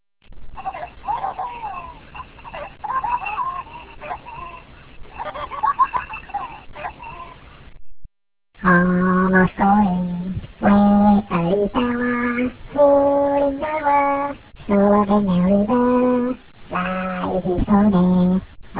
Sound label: Line Dance